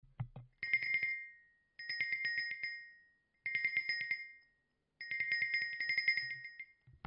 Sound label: Swiss trail bell